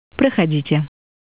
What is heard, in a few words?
open